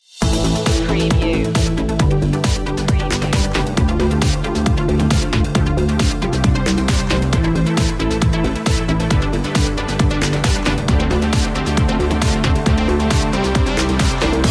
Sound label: liquid beat